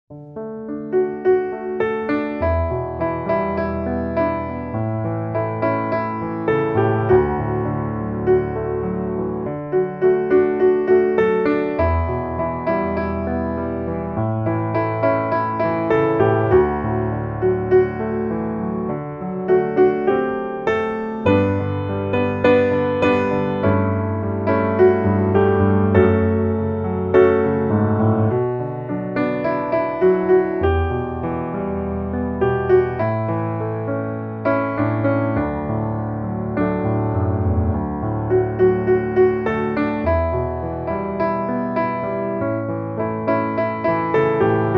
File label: piano